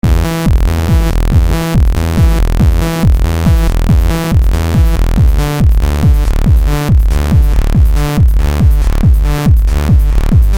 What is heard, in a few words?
Bass